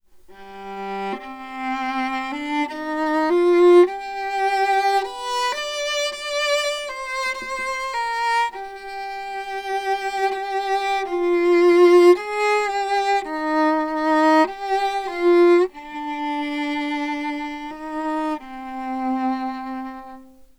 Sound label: music anechoic